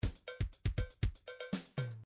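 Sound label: drums blues rock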